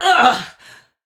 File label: Pain; Warframe; Tau; Dax; Ninja